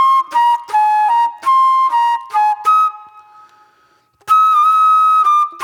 Flute Noir

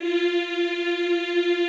EEH F3, Extended Classical Choir; Best Service

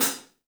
3D Drums, TIGHT ZILDJ; 3D Sound

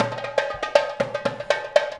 DARBUKKA

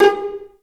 JD PIZ, STRGS

